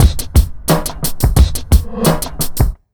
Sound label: BEAT, Underground Hip Hop